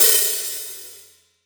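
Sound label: open hi hat, Real Drum Kit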